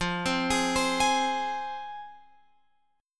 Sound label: F Minor, F, Guitar, Open F; arpeggiated; x,12,12,15,15,15